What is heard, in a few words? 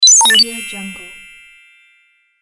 sparkling award